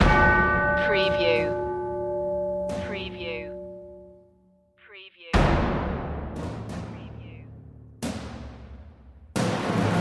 stormbloods percussion only

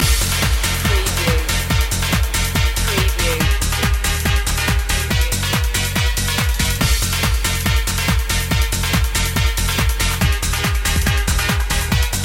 anthem flip

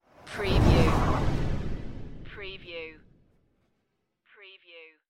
dark wind blast

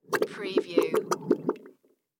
Mud Bubbles Object Sink

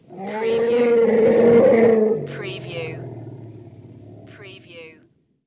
Elephant Indian Trumpet Single

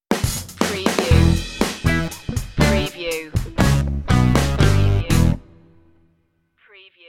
funky strut stinger

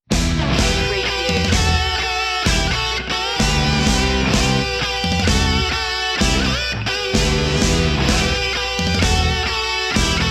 fight master instrumental

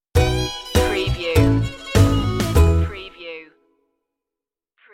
country thing stinger

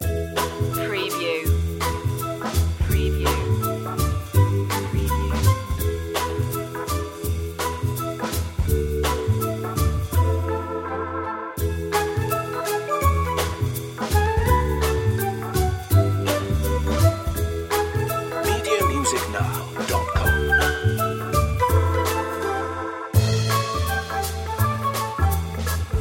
retro showroom loop

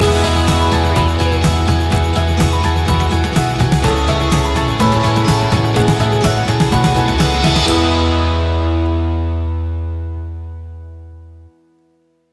the skys the limit no vocals

